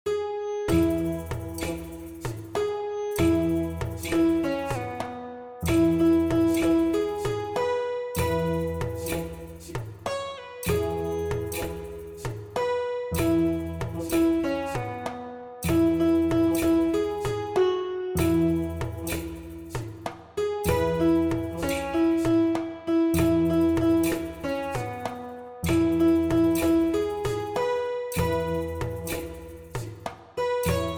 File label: Karaoke swing low